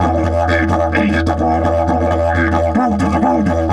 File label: E 130, didgeridoo; Big Fish Audio - Didgeridoo and Other Primitive Instruments; 130BPM